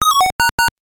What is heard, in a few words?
3x Chain & Player 2 Attack